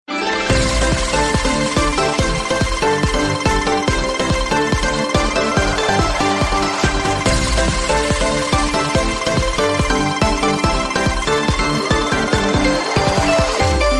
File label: free spin charge